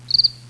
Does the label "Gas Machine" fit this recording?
No